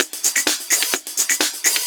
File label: Electro Loop 128BPM; Vengeance Samples